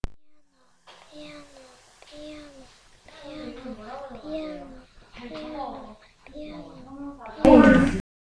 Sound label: piano